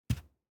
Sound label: DICE on Felt, Grab, Standard, 1 One Die; Samples; ArticulatedSounds; DICE Sfx Party (Extended)